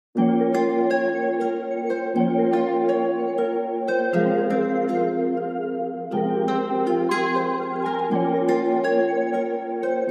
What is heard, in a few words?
Dream Rap Remix